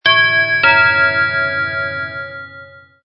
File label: multiuhr gong; elektronik